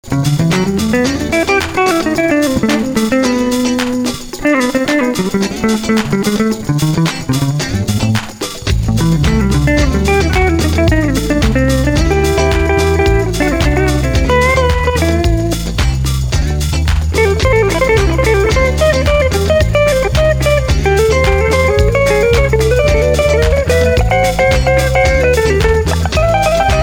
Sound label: funk